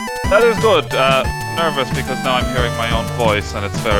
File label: hehehehe